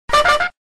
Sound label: toot; cave